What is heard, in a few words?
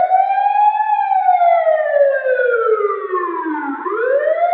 POLICE SIREN NORMAL; gta1 cars